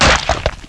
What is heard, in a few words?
SPLAT